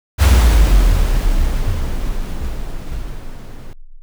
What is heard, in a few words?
mine explosion